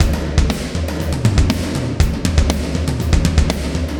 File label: 120 POWER, drums